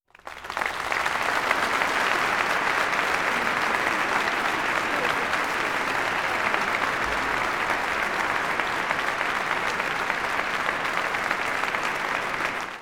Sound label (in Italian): Applausi, Olofonia